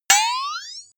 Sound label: boing flexatone